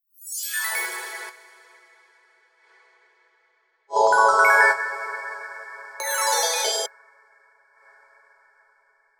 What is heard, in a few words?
Melodic Fills